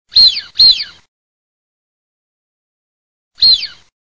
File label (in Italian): FISCHIONE maschio